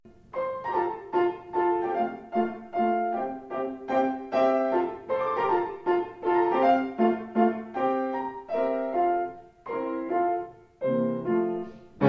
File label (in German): klavier